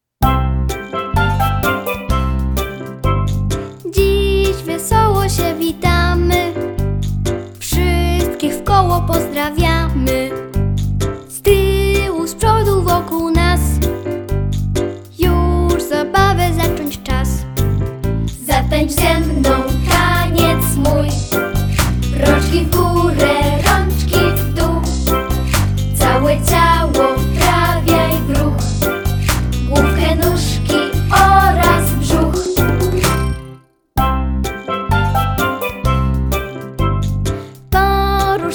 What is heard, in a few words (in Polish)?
piosenka